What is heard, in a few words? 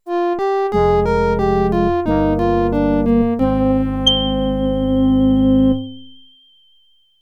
Defeat; Romance of the Three Kingdoms; X68000